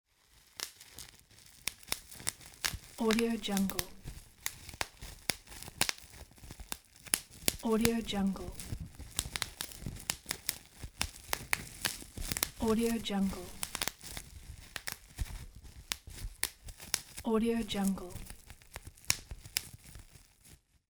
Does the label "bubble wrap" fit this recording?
Yes